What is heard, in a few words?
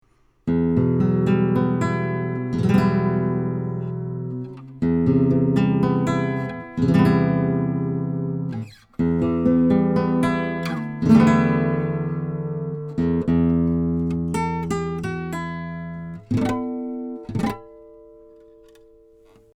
Nylonstring Omni; telefunken tf47